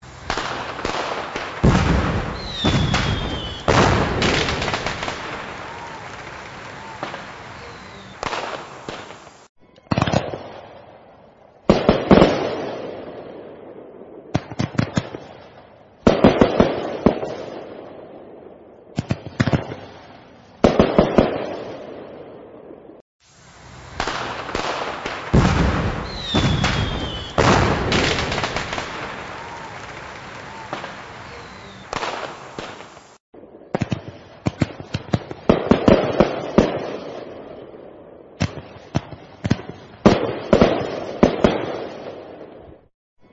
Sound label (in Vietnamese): Tieng phao hoa no tren bau troi